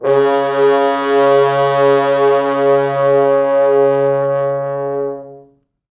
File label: 4 FrHorns; C#2; East West; Quantum Leap Horns Sax; 4 FrHorns MOD